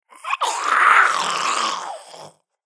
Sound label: Zombie Attack; زامبی